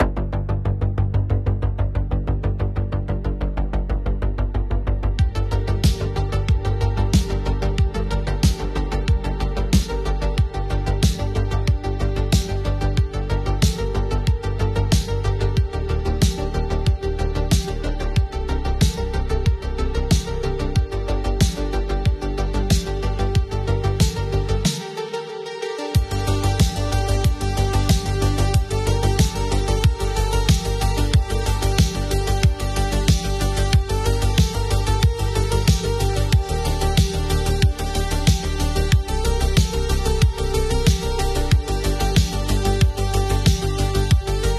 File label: spectral engine; blade blacksmith; nocturnal machinery